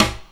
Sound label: RIMSHOT; Back In Time Records; Big Bang; SNARES